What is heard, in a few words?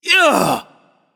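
nonverbal ouch; smite; voicepack; merlin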